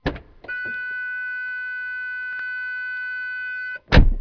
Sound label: vehicle